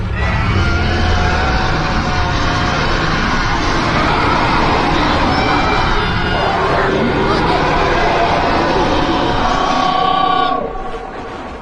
Roar; Yokaiju

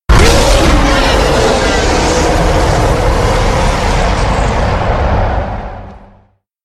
King Kong roar

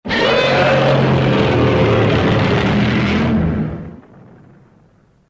T-REX Roar; The Last Dinosaur

